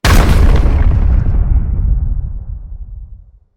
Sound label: Massive Bomb Explosion; explosion blast